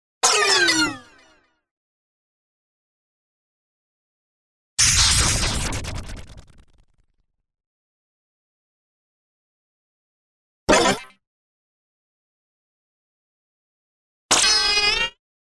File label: various zaps; lazers